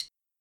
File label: Drumstick